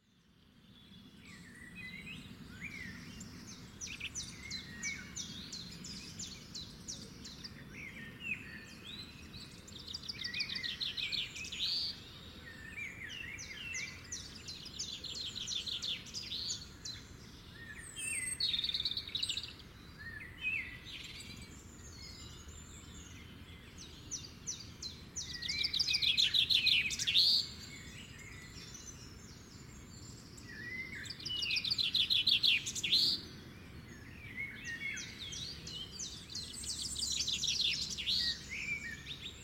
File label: Bird Sounds Spectacular Morning Bird Sound